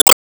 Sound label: Bounce Ball Hit; gamerip